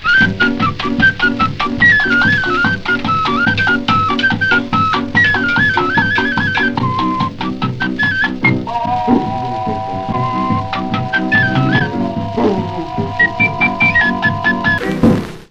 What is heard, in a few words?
flute drum music tag